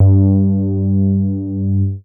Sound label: MOOG BASS G2; Best Service Dream Experience